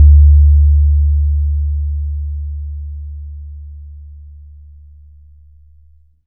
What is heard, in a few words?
BAYAN; Q Up Arts; Denny Jaeger Private Collection